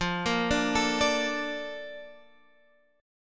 A sharp Major 7th F

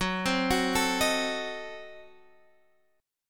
Gb Diminished 7th

